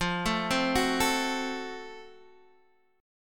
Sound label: F sharp Minor Major 7th Flat 5th F